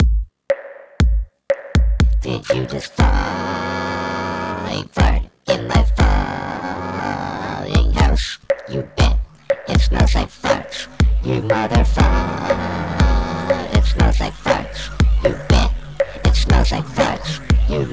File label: farts